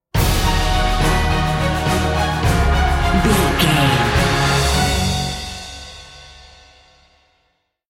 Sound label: cinematic corporate sting bumper